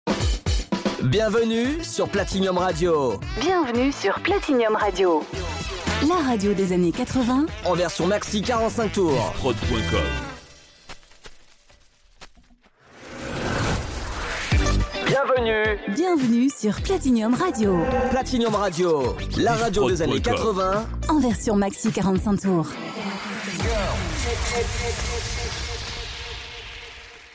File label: Radio Jingles; Platinium